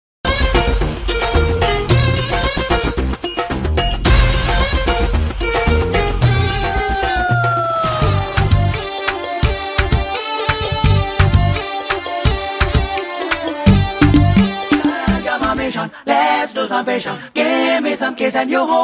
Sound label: Mashup Telugu Ringtone; Julayi